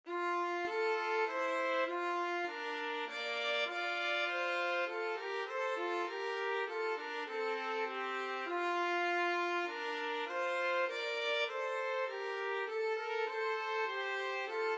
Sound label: trumpet violin duet